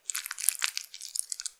普通, 挿入 or 引き抜かれ終わる直前; ■挿入or引き抜く

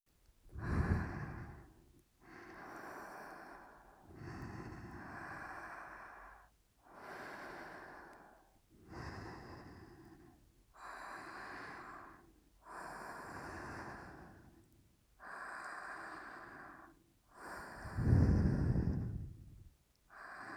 寝息; スキ×キス ご主人様が眠りに落ちるまで耳元で好き好きちゅっちゅしてくれるメイドさん; DL製作班

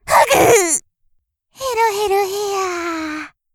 殴られ失神; 失神直前

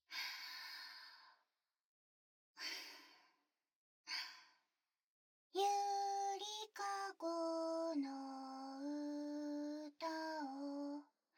子守唄

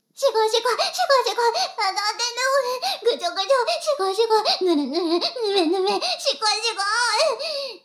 しこしこ音声; 牧場戦腺; 少佐殿

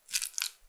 くちゅ音単発

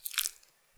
膣外射精

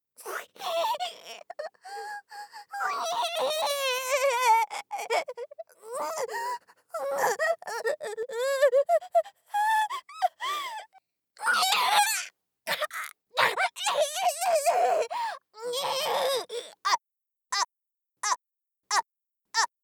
蟲; 効果音なし

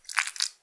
膣内射精

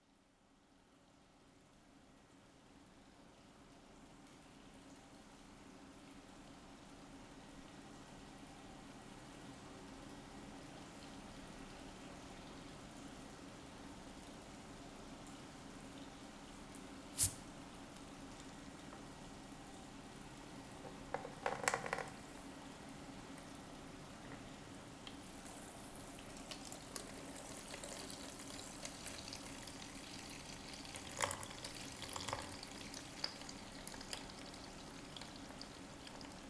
クールダウンとヘッドスパ

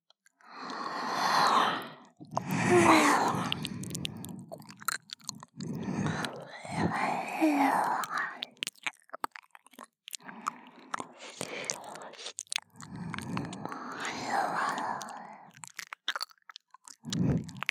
優しくぺろぺろ 声なし, 左耳; カスタマイズトラック; フリー耳舐め（左耳パーツ）

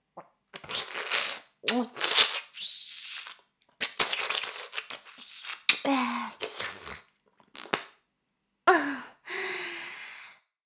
耳舐め音声; 牧場戦腺; 少尉

